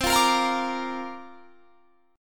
C 7th Suspended 2nd Suspended 4th C down; C7sus2sus4 strummed

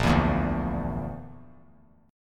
A sharp Major Flat 5th; strummed

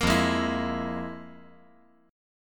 F Minor Major 11th, F

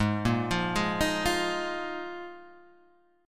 D sharp Suspended 2nd Suspended 4th G sharp

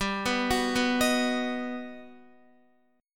G Augmented Major 7th, Guitar; G+M7 arpeggiated; x,10,11,11,11,11; 4F,4A,5C,5G,5C,6E

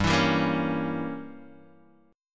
G Diminished 7th G down Guitar Open D

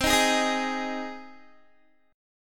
C Minor Sharp 5th C Guitar 4E,4A,5D,5G,5C,6F; Cm#5 strummed; 20,18,18,20,20,x

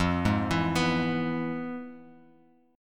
F Minor Major 7th Flat 5th Guitar Open F; FmM7b5 arpeggiated; JGuitar

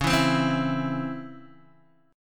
C sharp Minor Major 7th C sharp Guitar DADGAD

